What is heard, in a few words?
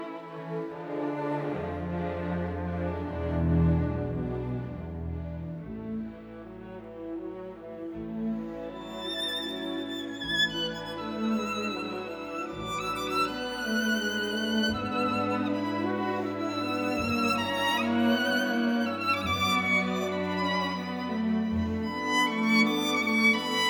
Nocturne From String Quartet No 2 in D Major